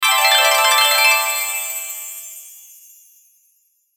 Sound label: Pixie Dust Harp Chimes Shimmer; Magic; Blastwave